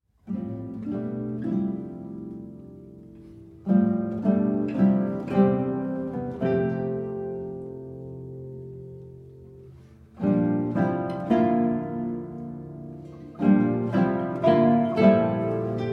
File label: chorale